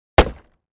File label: Axe Fire Log, axe hits